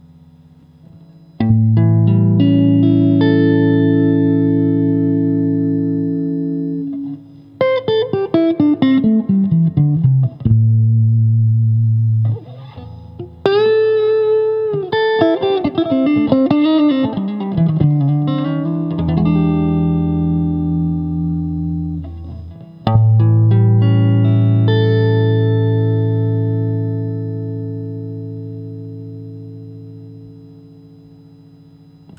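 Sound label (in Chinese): 60S OCC 单晶铜 清音 2档, acoustic guitar; kindman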